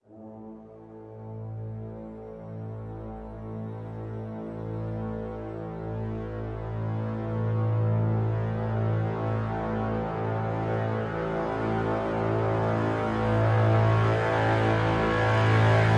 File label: Cres Brass Swell Lo (A)